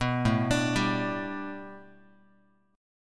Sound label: A 7th Suspended 2nd Suspended 4th, Guitar; A7sus2sus4 arpeggiated